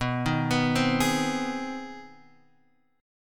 C Minor Major 7th Sharp 5th B, chord

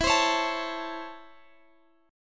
Db Minor Major 7th Sharp 5th E

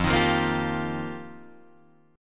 F 9th Suspended 4th F Guitar Drop D, chord

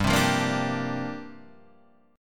C 7th Suspended 2nd Suspended 4th, G

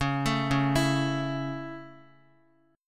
C sharp Minor Sharp 5th down Guitar; C#m#5; arpeggiated; JGuitar

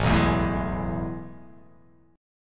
A Major 7th Suspended 2nd, chord

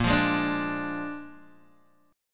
A sharp 7th A sharp, Guitar, Open G

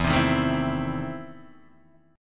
E Major 7th Suspended 4th Sharp 5th; EM7sus4#5 strummed

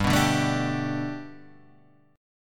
G Major Add 9th chord; Gadd9 Chord {3 2 0 2 3 3} strummed

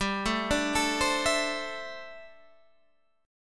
D 9th Suspended 4th, chord

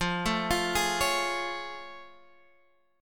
A Augmented Major 7th F

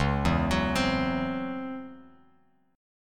C sharp Minor Major 11th, Bass Standard; C#mM11 arpeggiated; 9,7,16,17; JGuitar

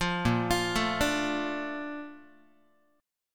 A sharp Major 7th, Guitar; A#M7; arpeggiated; JGuitar

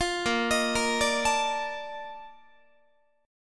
A sharp Major 11th Guitar, chord; arpeggiated

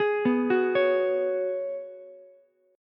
G sharp Minor 11th, Ukulele C6; arpeggiated; 13,11,14,16

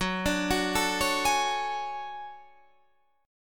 D Major Sharp 11th F sharp, chord

